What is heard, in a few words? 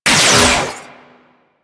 Trip Laser Impact; Warframe; Trapper